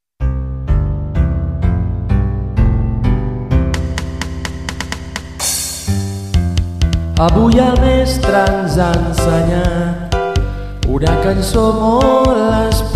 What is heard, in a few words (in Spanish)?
escala